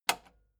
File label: metal button spring click slow